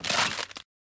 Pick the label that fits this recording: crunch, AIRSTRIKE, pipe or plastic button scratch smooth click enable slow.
crunch